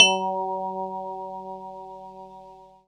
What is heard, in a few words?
Fender E-Piano; instrumente